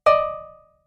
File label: Message Error, beep